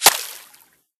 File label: water; Escape from Tarkov